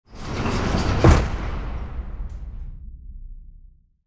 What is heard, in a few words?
Jail door close prison distant perspective